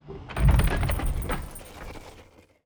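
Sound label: siege initial movement; Onager, Ballista, Ram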